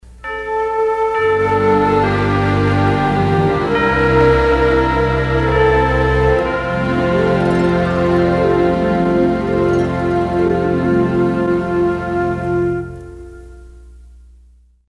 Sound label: Instrumental Long; Oral Roberts; ORP Openers & Closers